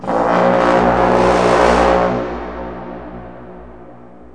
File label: bighorn